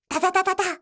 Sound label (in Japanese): dadadadada